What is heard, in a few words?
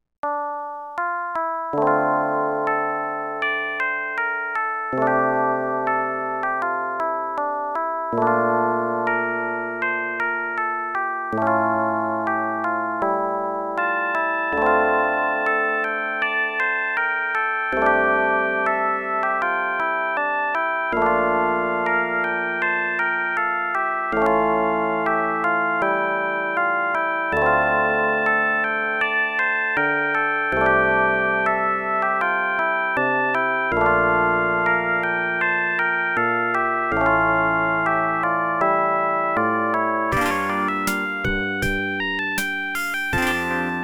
ADLIB OPL2